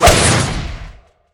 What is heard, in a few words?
Fast Blow